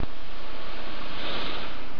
bfg hum, weapons

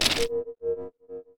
invul impact

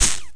lightning hit, weapons

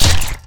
nailgun

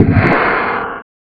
health

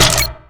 weapons proxmine

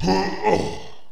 death

